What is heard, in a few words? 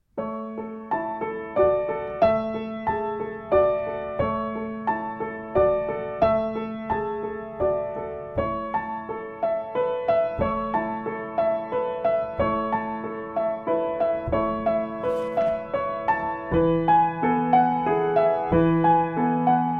acc